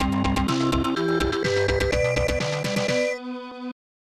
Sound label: Clear Level; The Dungeon; Corn Buster; SNES